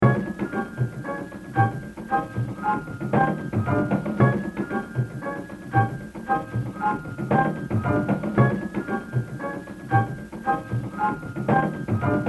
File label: Jazz Drumming; Exercise 2 Baby Dodds - 100%; The Evolution of Jazz Drumming (Gottlieb)